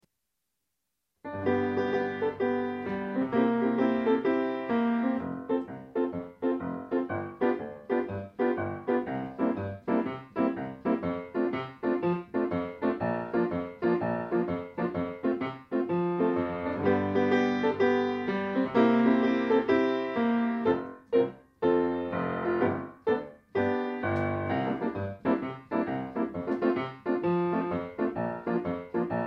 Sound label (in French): goutte robinet inst